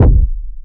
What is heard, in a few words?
REST STOP KICK